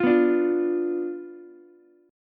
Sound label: B Diminished B down Ukulele; Bdim strummed